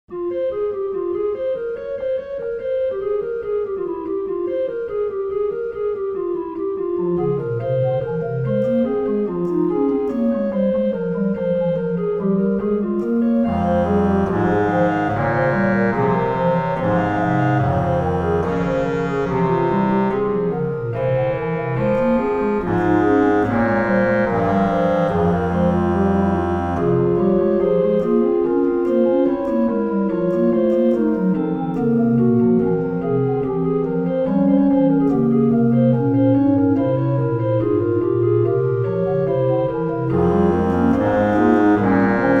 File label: f minor bourdon 8 dry, organ